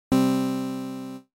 Synth With Fast Attack

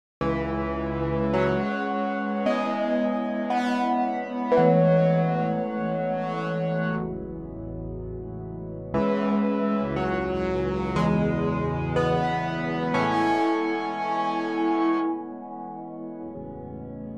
Wavetable Position

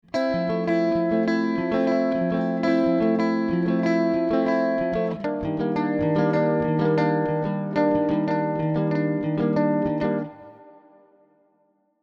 Guitar W Spring

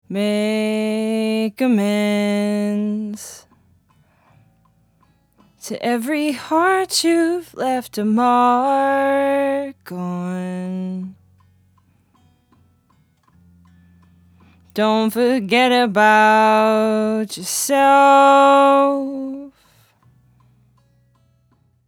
Vocal Not Gated

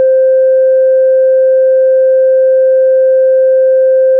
Osc and Mixer

